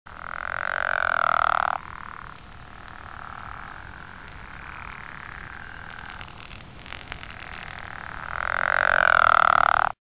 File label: pickerel frog